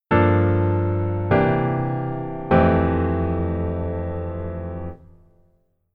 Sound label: keyboard voicing low